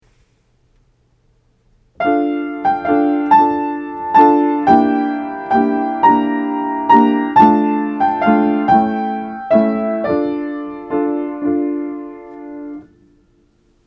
Harmonic Rhythm; Aural Training exercise

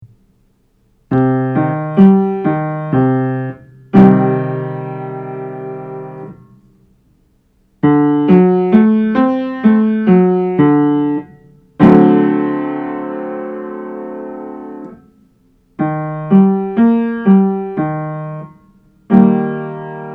Diatonic Harmony; Aural Training exercise

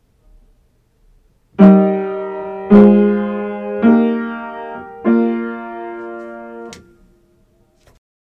Second Inversion Triads; Part Writing; Aural Training exercise

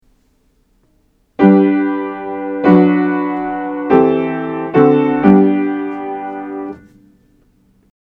Root Position Triads; Harmonizing; Aural Training exercise

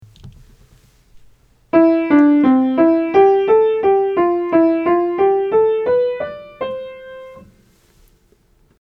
Major Scales; Aural Training exercise

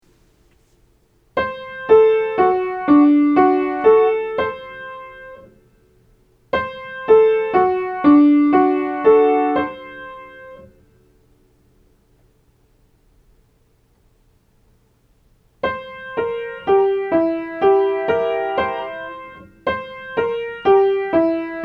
Major minor 7th Chords Aural Training exercise

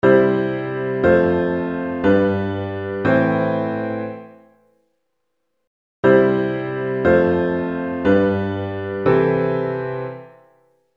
V vi6

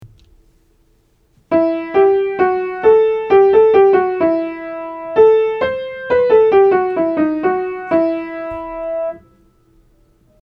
Embellishing Tones Aural Training exercise

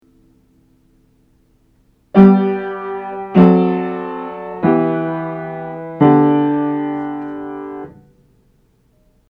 Part Writing 7th Chords Aural Training exercise

